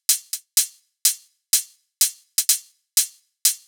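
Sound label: Hat Loop, 125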